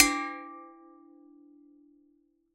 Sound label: Water Strike, vase